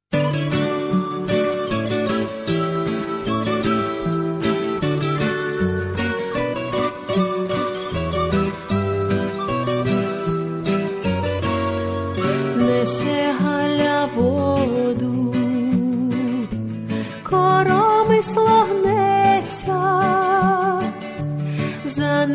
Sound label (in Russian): Ukrainskie narodnye pesni; Nese Galya vodu